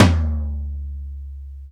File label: Toms